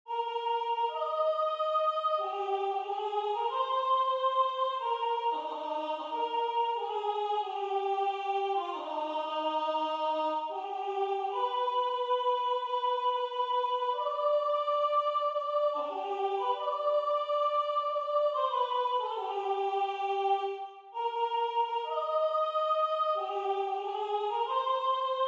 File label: Soprano; Chanton Piperri; Hymno final; Buenaventura Zapirain